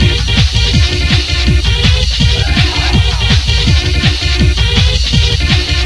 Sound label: easy groove; headz